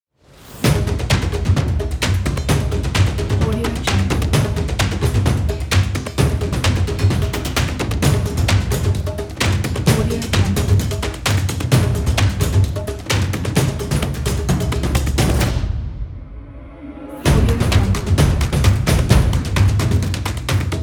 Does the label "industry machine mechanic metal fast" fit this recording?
No